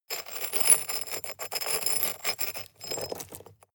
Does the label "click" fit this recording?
No